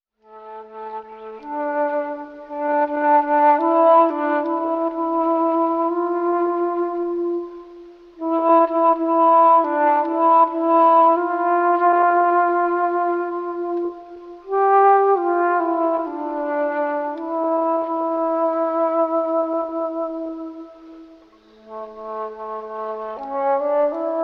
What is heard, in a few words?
flute, Loops, Sad